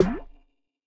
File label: Cart Snare; Nova Sound